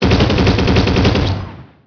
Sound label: gun; Emperor - Battle for Dune